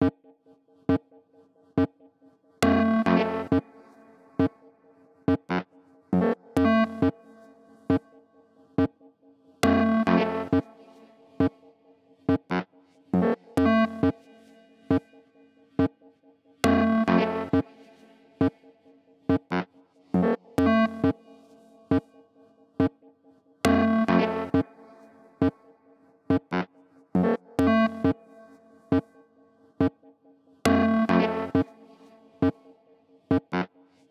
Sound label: Caves Synth